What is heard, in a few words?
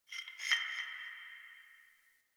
Interior Distant Marble Hit; Warframe; Nineteen Ninety Nine